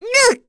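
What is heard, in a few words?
Vox Damage, Korean; Cecilia; kingsraid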